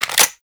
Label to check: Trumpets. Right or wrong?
Wrong